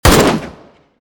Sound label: wa2000 fire; STALZONE